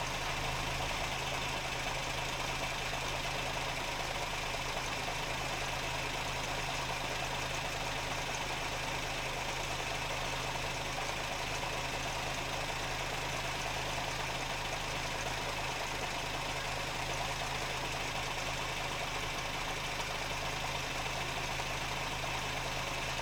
Truck, old, idling, engine bay, underneath, near exhaust; loop; Eiravaein Works; Vaeyan IV